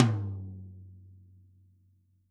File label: T12 F L, TOMS; Living Drums; Sampleheads; Peter Erskine; PB KICK+TOMS